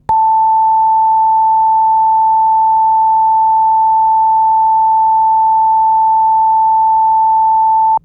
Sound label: signal, wav880